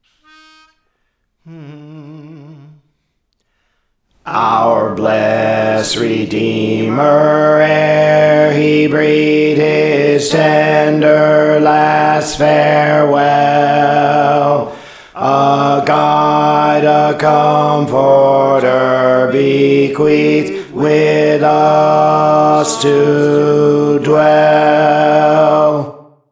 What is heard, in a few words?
Alto; Our Blest Redeemer ere He Breathed; Praise For The Lord Training